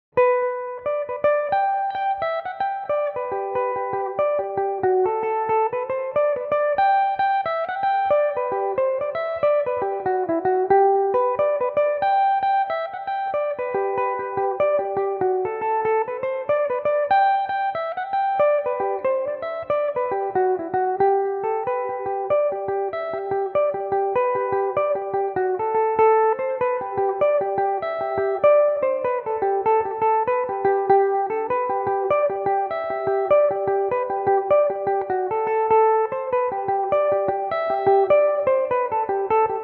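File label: G major, Irish mandolin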